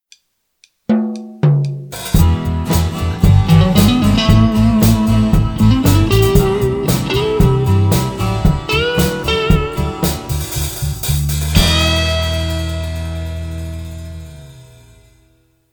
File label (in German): Song Mono Mixdown ohne Kompression